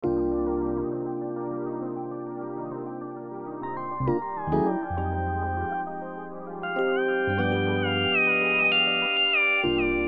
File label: melodic loop C minor 100bpm